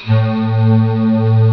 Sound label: d50 synth choir, Amiga Soundtracker Sample Packs